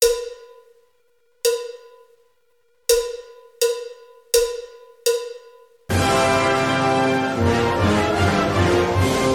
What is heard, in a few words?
Fanfare Tattoo